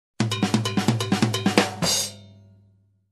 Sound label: Jazz Drum Intro Break Toms and Cowbell